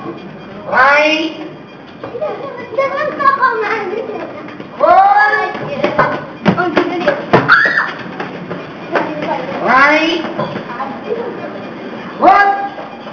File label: alley; Sounds from the side alleys of Marrakesh; Each of these were originally recorded on my trusty Psion